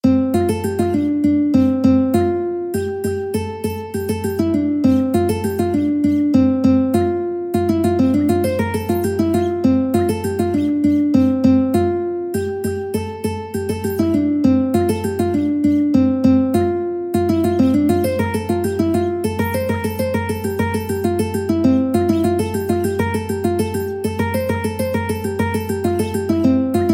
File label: ukulele